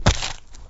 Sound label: Mountain Rock Light Med, shagov